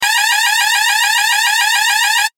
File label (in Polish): ton